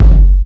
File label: TOM, TOMS KIT, Ragga & Dub Reggae